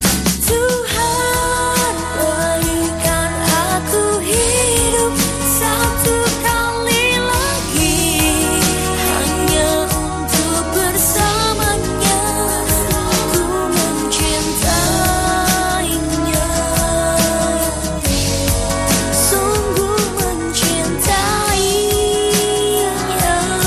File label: indonesian ringtone